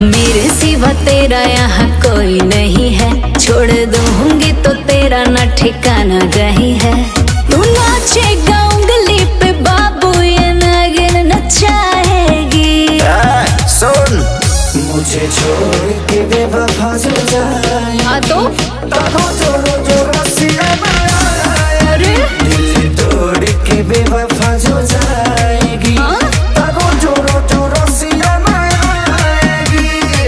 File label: Bhojpuri New Song